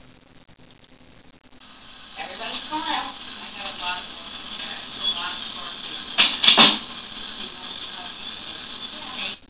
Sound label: door slam but not really